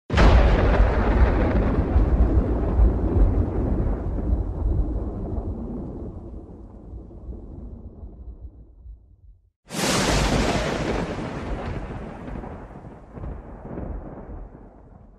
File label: thunder and lightning storm sound effects